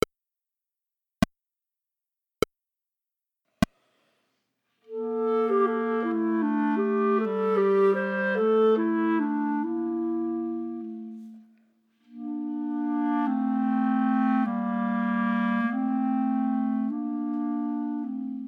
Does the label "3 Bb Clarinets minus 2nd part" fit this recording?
Yes